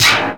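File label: percussion FX, Dance Drums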